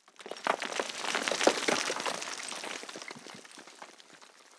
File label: explosion impact dirt